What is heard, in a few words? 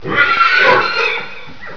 SCREAM, Animals